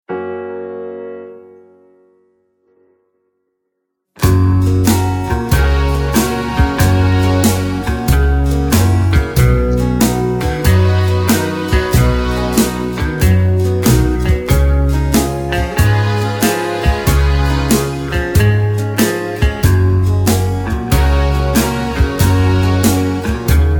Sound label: (Gb) No Backing Vocals